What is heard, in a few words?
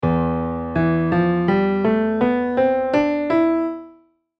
E PHRYGIAN